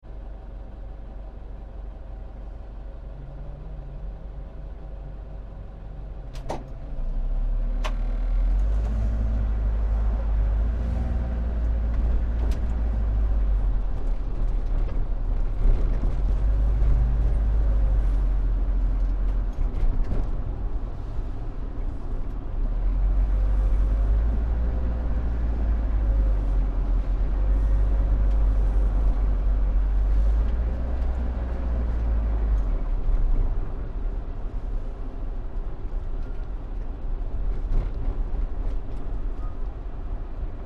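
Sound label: Taxi Black Cab - Driving around London - stops and starts - siren past; Tom Hutchings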